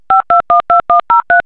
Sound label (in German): anruf